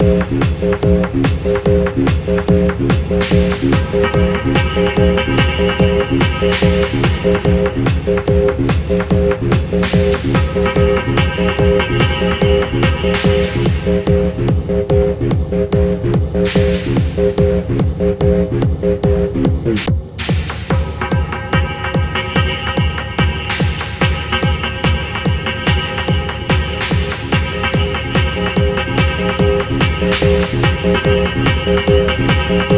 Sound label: Techno Techno; Buckshot Roulette